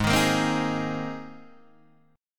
G sharp Major Add 9th G sharp, Guitar Open D

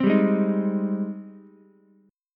G sharp Major 7th G sharp, Ukulele; 5,7,4,6; JGuitar

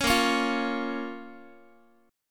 Bb Minor Bb down Guitar; strummed; JGuitar

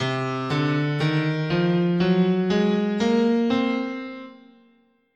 C Locrian sharp 2, scale

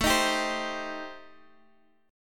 A Minor 7th Flat 5th A, Guitar, DADGAD

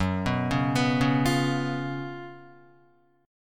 Gb Major 7th Suspended 4th Gb, Guitar; arpeggiated; 4F,4A,5C,5G,5C,6E; JGuitar

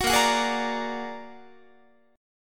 A sharp Augmented 7th A sharp Guitar; A#+7 strummed; JGuitar

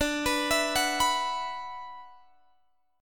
D 6th Add 9th, Guitar, Open G

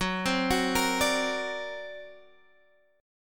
D Augmented 7th F sharp, guitar

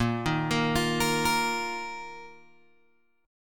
Bb Suspended 4th, Bb, down, Guitar, Open G

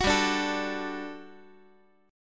G Major 7th Suspended 2nd G down Guitar; GM7sus2 strummed

